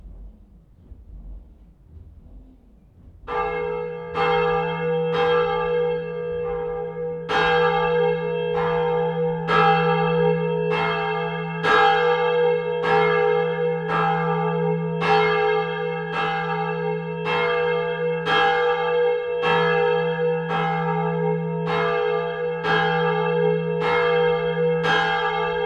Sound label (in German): Glocke, Kirche Glocken; Unsere Kirche Glocken; Northeim; kg stsixti northeim